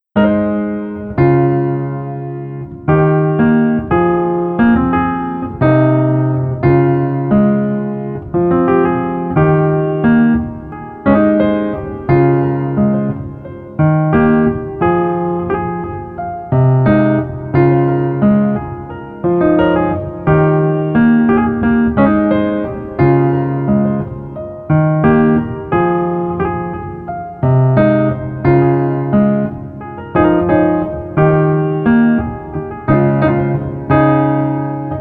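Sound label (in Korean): Piano version 멜로디 MR 여자키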